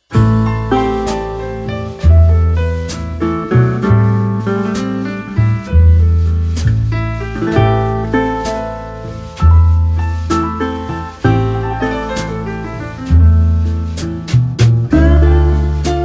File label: Rainy Day Blues Instrumental